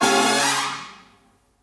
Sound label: Flashover